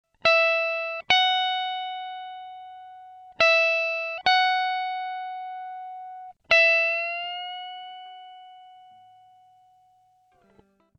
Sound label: high bend